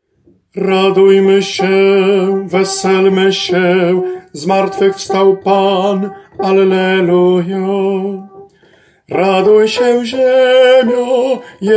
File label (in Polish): SOPRAN; Radujmy sie